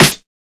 sizzly snare single hit g key, snare one shot tuned to the G note; snare drum samples